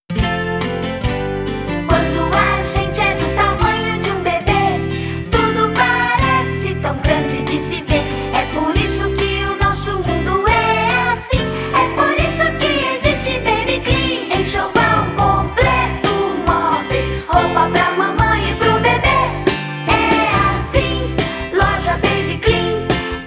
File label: jingle